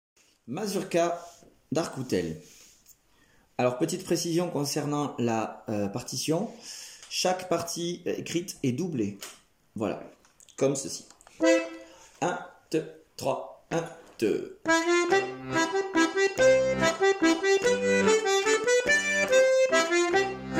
Mazurka d'Arcoutel

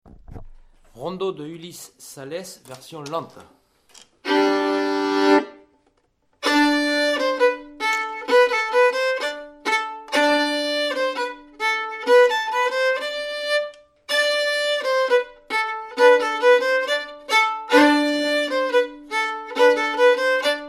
rondeau lent; u salesses

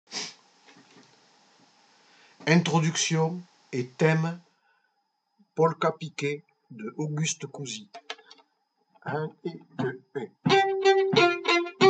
Intro et thème polka piquée; A Cousi

